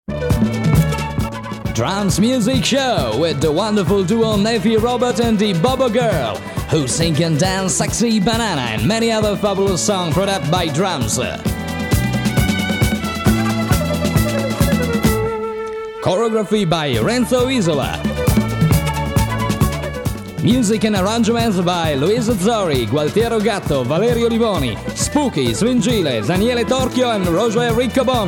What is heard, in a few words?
drums, intro